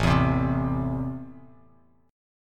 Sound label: A Minor, Bass Standard; Am strummed; 5,7,10,5